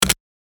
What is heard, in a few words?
typeing